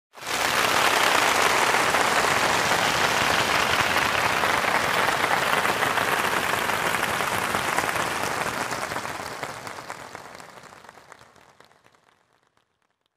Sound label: Concert Applause Crowd Clapping